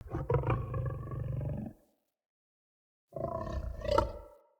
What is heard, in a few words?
Wolf Idle Grunt, Animals Wolf Vocals